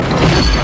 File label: AT-AT walking